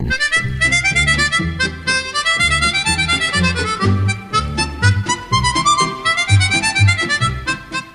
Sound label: Ring Tone; Navy Lark